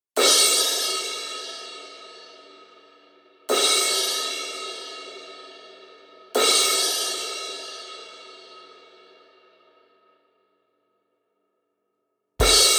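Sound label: 18 HH Vanguard, cymbal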